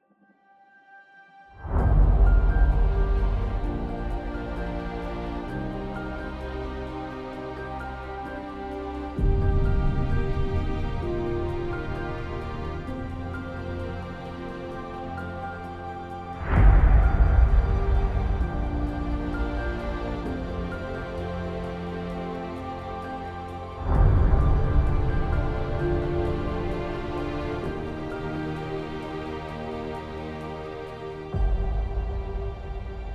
string theme with atmospheres and impact and ostinatos